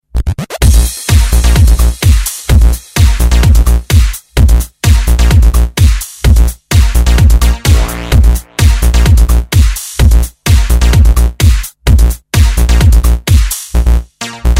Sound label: Electro